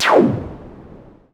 Noise Hit

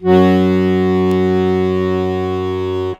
HOHNER, akkordeon

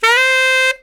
SAX LICK, BRASS LICKS; Ueberschall; Jam Box

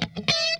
SQUASH HIT, Funk Guitar; 90 s Sample CDs; Zero G

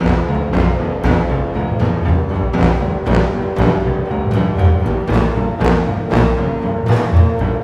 GRO, Orchestral Loops; 128 COAST ROAD

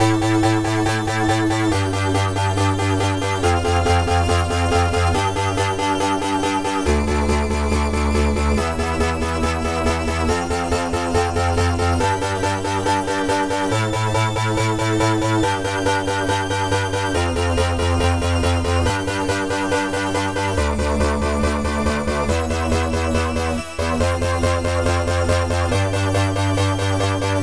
140BPM A; Vengeance - Essential Clubsounds Vol.4

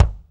BD, Roland; Sound & Vision - Gigapack I

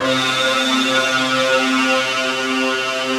big disto; East West - Global Sounds

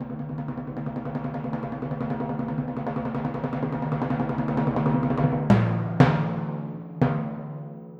Timpani, Orchestral, Rock Pop

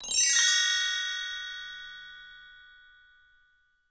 CHINESE, BELLS CHIMES; Voice Crystal - Steve Reid Definitive Percussion

